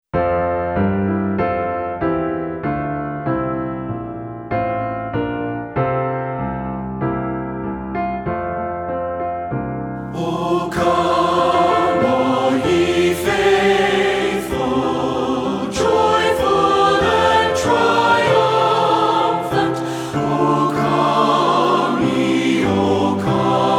Piano; Christmas Carol Trilogy

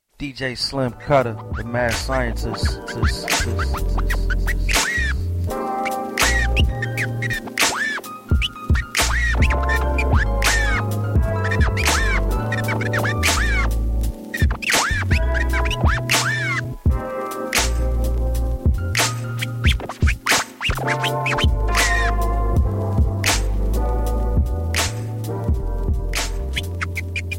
scratch; praise him lord jesus christ

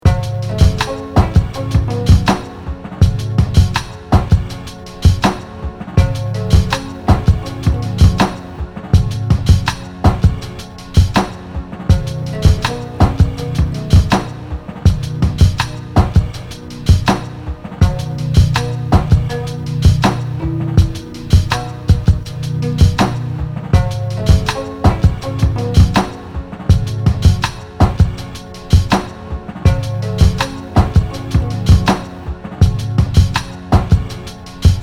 drums

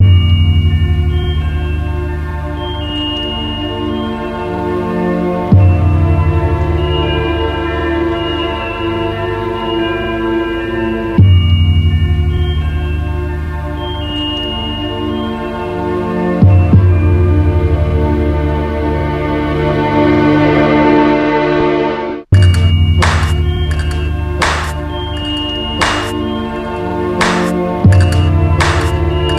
nice strings

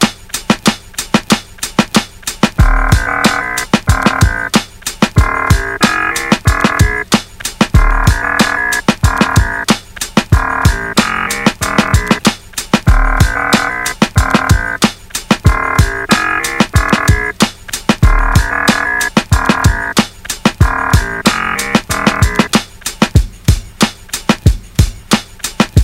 cuts beats breaks samples stuff; threepeeoh

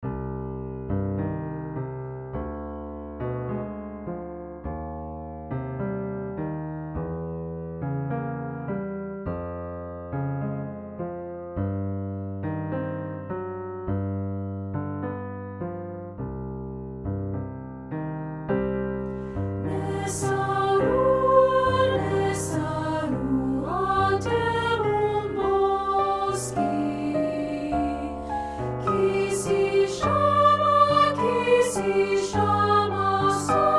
Latin American Folk Songs; Nesta Rua

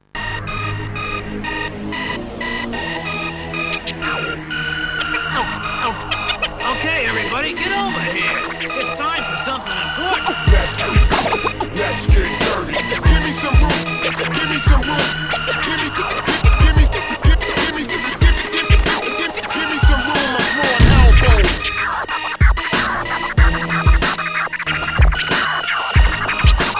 beat

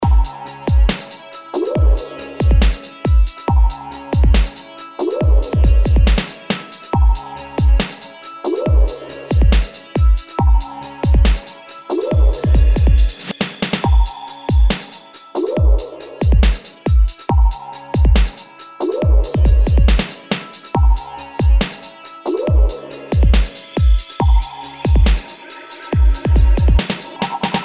loop

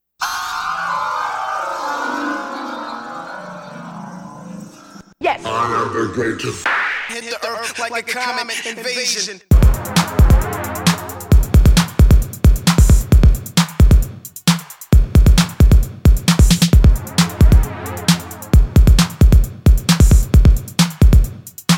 Electro Invasion Beat

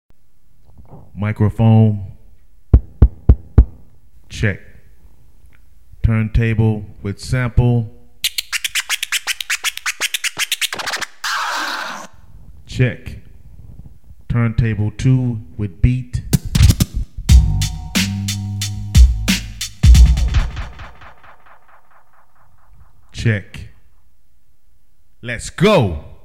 scratch

